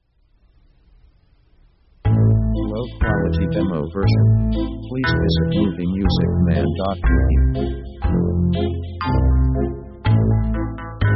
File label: finding the trap, Dark mood